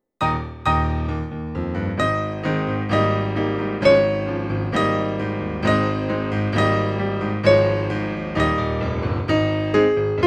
melody

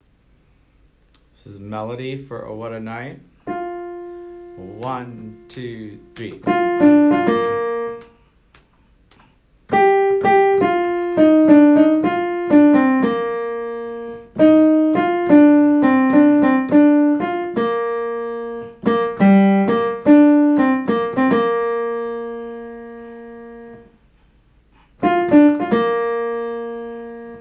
melody

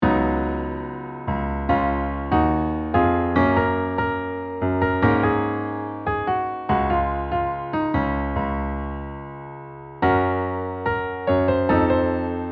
piano

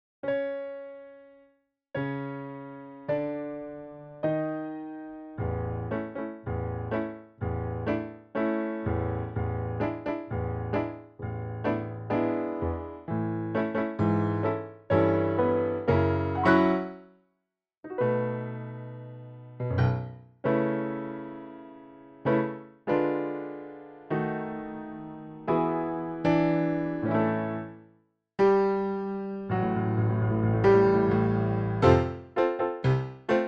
backing

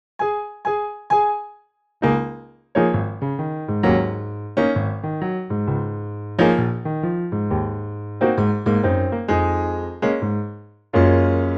Backing Track

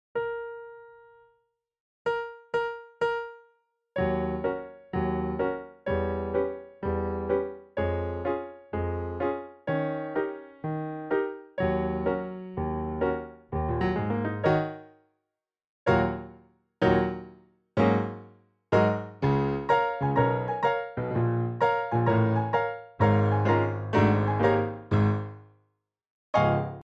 Female Ensemble Backing

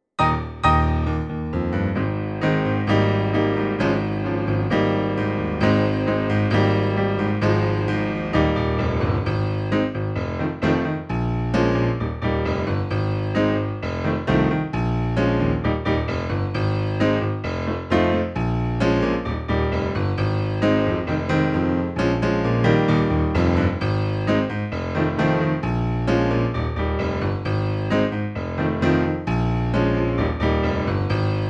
accompaniment

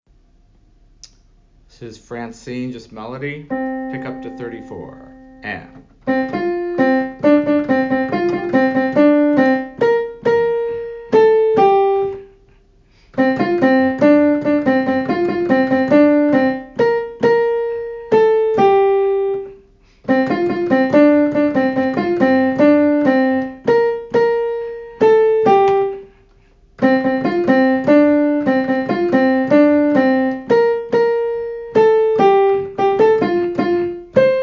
melody

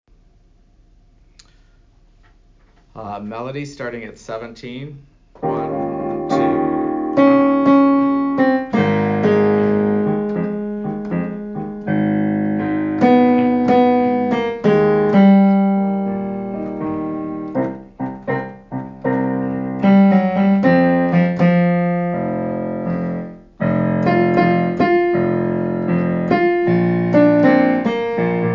MELODY

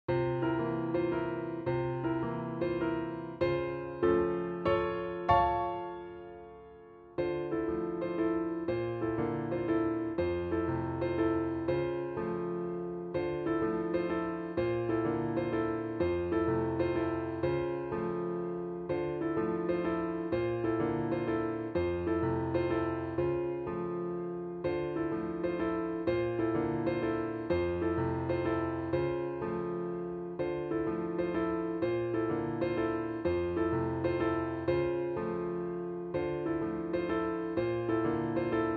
accomp

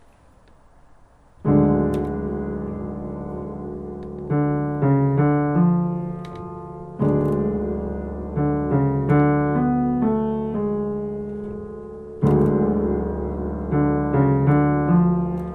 melody

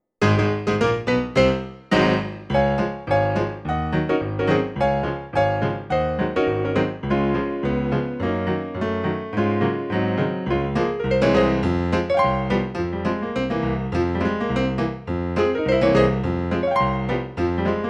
Accompaniment

